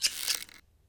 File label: Cut Rope